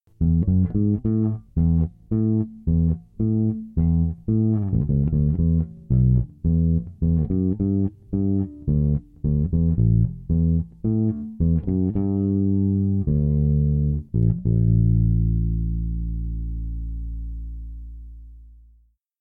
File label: bass